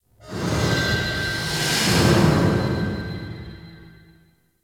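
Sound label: Large Cargo Room Distant Ambience; Warframe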